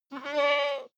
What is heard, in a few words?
goat baa shy male